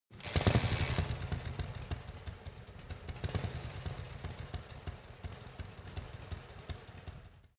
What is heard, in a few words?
motorcycle harley engine kickstarter on